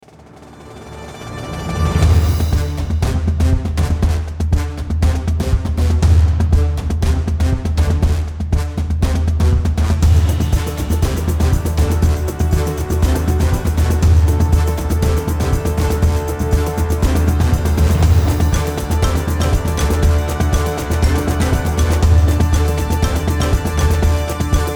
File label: Marching Band Drums